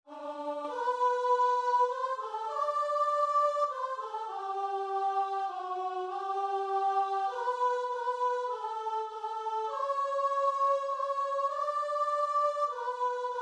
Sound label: crimond, guitar and vocal